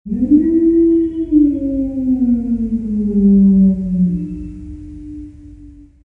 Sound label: wolf; Garry's Mod; tf2